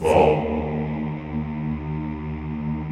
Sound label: CHO ES1, Vohmm Hohmm; Sound & Vision; Gigapack I CD 2